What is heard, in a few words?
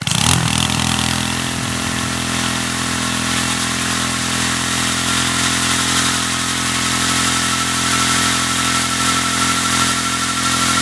acceleration; Stud-Rider